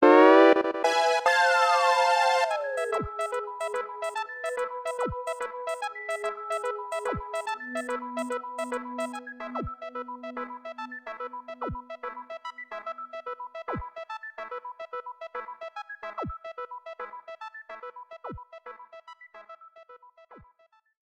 Round Clear; List of Dr. Mario 64 media

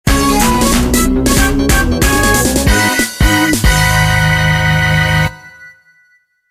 Jingle

